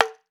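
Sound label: Mexican TAMBO, Latin Shakers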